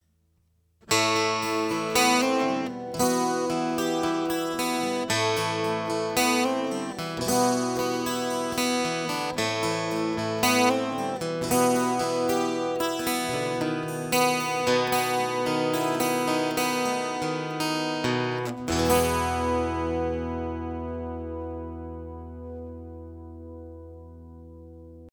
Fishman Aura Spectrum 12 string Yamaha APX9 12 Bypass